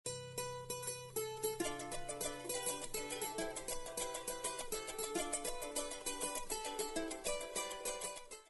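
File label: venezuela background music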